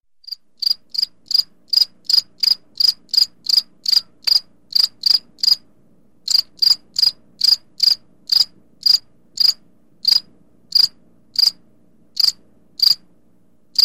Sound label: Bugs